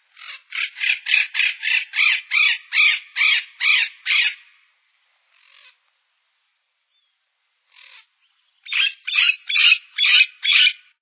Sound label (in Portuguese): curica